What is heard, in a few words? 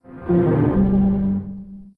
Shutdown; Windows SP-89; Windows Never Released Soundpack 1; deviantart